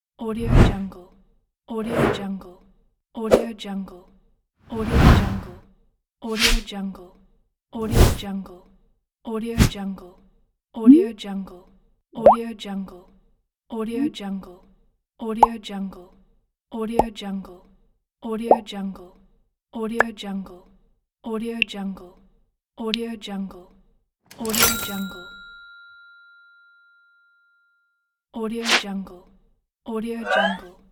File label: corporate animation pack; evdokim-music